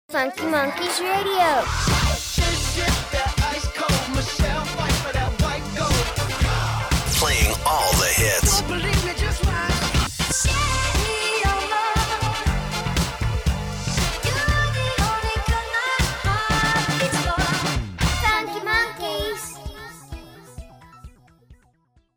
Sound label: Radio Music ID; Funky Monkeys Radio